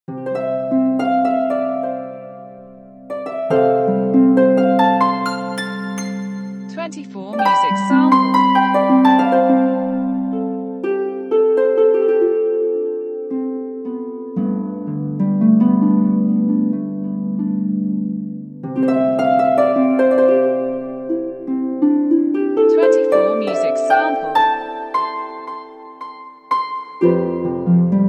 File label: harp samples